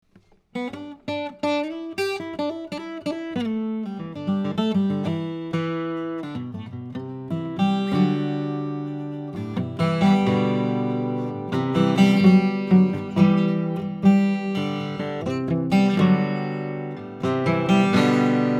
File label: Improv, Guild12; Guild 12-string guitar; electric acoustic